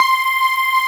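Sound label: VOICE SYN C3; CMP - Analogon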